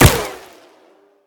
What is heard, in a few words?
Gunshot Miss; The Town